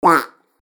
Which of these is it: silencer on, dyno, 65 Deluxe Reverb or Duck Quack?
Duck Quack